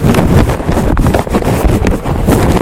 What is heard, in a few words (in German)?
Feuerzeugflamme im Sturm; Feuerzeugflamme im Wind